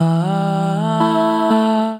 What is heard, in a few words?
Combined Rack Root E, Basic Vocal Racks; Voice Box; Harmonic Vocal Racks